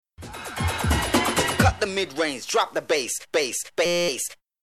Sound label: Stretched vocals ('bass')